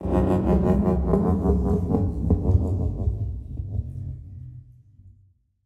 诡异森林嘎吱声; ambient

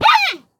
熊猫受伤声

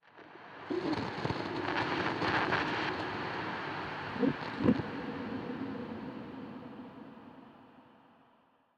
诡异森林点缀声; ambient

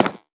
下界砖踩踏声

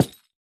樱花木悬挂告示牌破坏声

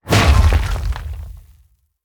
重锤地面重击声

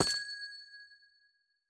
紫水晶踩踏声

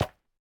树脂砖踩踏声

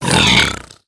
猪灵死亡声

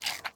熊猫进食声

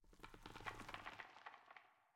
苍白垂悬苔藓声

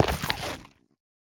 下界岩踩踏声

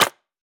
泥砖 踩踏声

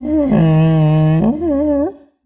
嗅探兽待机声

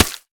泥巴破坏声

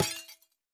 铜灯破坏声

